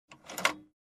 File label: Aircraft Cabin Crew locker closed